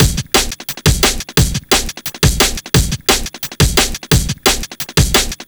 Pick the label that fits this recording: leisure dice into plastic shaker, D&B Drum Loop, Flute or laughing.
D&B Drum Loop